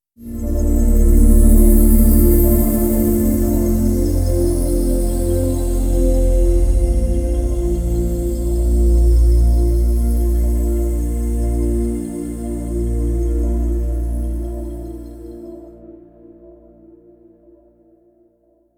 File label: Pad Active EQ